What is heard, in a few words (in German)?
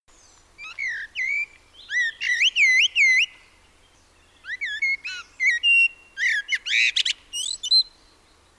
amsel